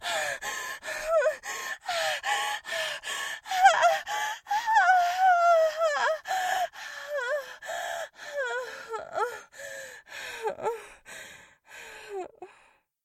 moans

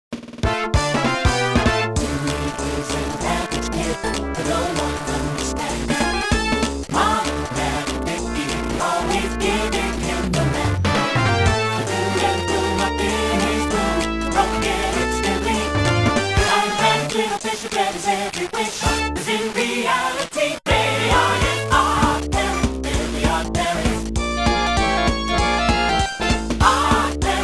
Sega Genesis Vocal Remix; SupremeMIDI

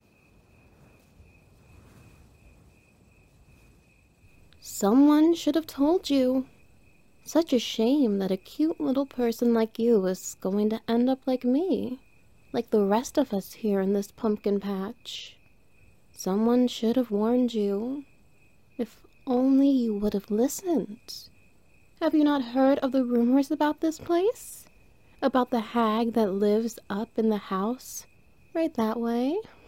Wet Noises

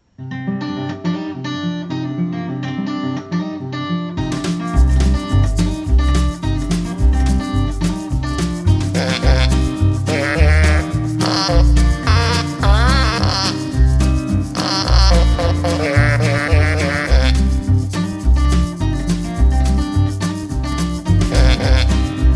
Fart Piano

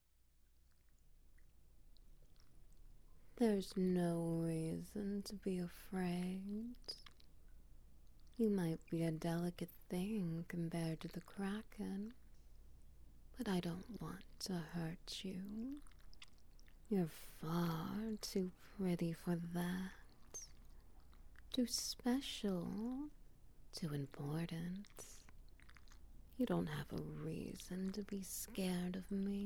Ambience SFX Wet Noises; A Sacrifice for the Kraken

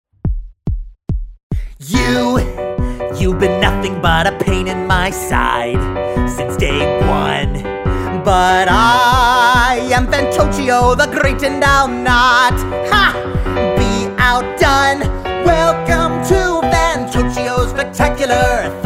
Piano

